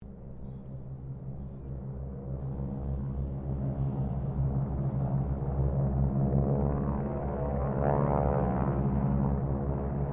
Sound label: Harvard squadron 4 pass distance, stereo; Mixed aircraft squadrons; Airplanes; Vehicles